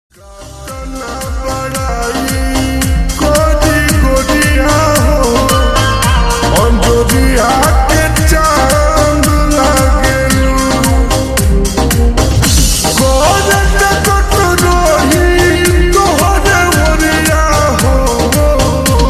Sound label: Slowed Reverb Ringtone